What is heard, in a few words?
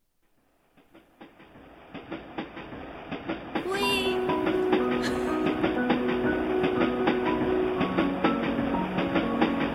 44100 stereo s16 le